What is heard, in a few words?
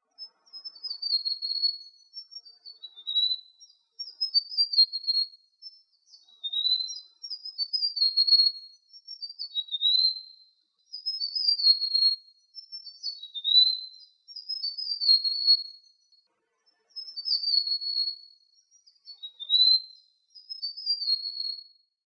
Russet crowned Warbler